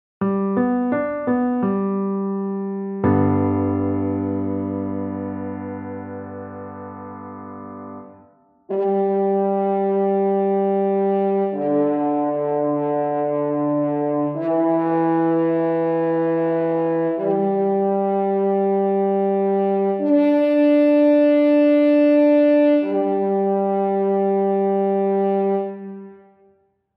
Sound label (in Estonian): Metsasarv; g-duur